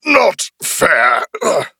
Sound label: Death; battlerite; Vanguard